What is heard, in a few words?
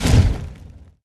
gryphon shot end